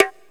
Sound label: Bongo